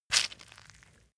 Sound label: leather impact mud soft squeeze